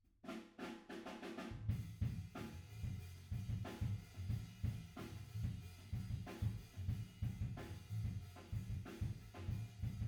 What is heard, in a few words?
Piano B